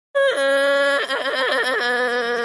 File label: crying